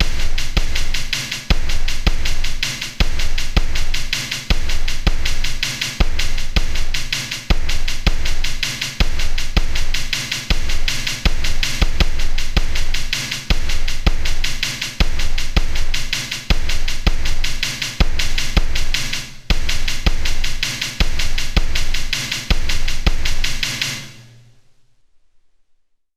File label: drums fast